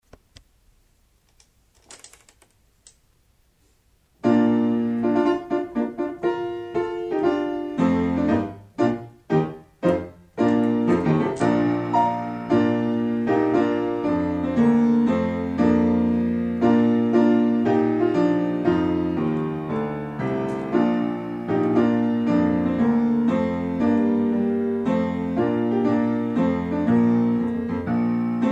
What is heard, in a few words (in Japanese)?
bansou